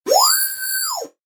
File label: Multimedia Internet CD Rom Flash Beep Evolver